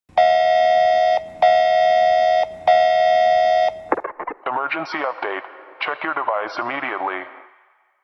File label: alert device